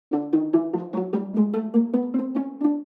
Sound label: Cartoon violin pizzicato ascend